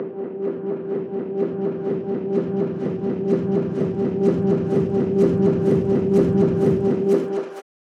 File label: piano hammers rising